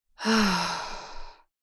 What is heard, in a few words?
Tired woman breathing